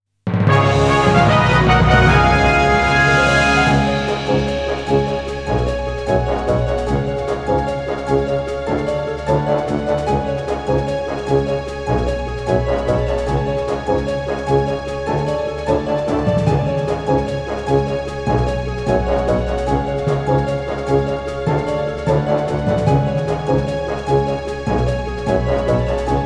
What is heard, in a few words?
Victory!; Radiant Historia